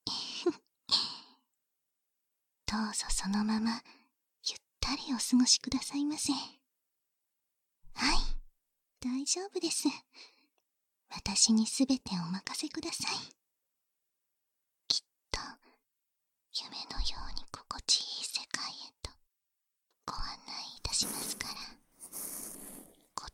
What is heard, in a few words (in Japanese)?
耳かき 右（バイノーラルマイク収録 効果音あり大きめ）; バイノーラルマイク収録のみファイル